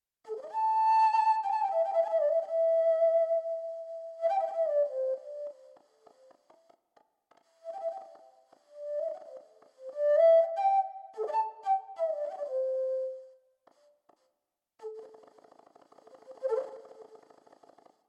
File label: Warbl Vari Flute